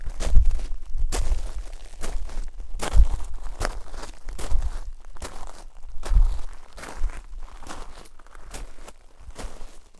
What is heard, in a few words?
walk